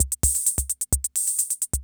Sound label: CR78